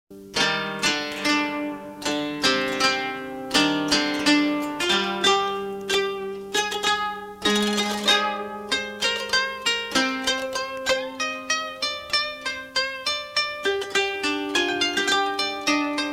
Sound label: japan traditional music of the japanese geisha; japanese fisherman